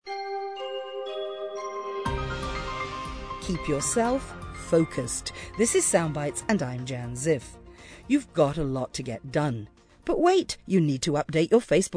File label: Focal Filter